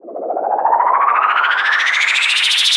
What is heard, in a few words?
Rise; SFX; 7rystan's 600+ FREE EDM SAMPLES & DRUMS